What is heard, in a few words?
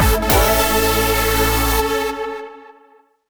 Minigame Complete; Bishi Bashi Channel